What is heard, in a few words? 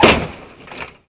collision; CRC2005; CRC 2005 Demo